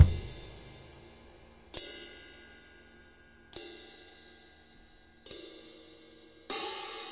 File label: six eight loop, 100 to 105 bpm